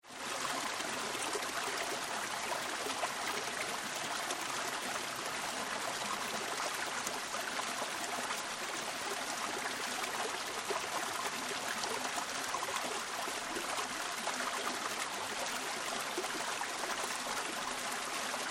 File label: Stream Through Sluice Gate, Close; Rivers; Nature; River Sound Effects